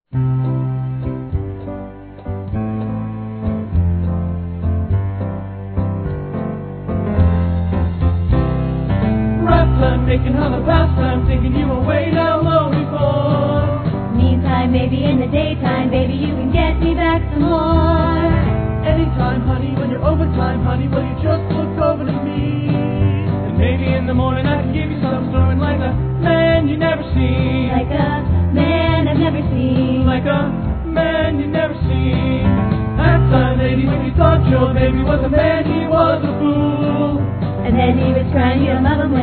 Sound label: Rap Time Studio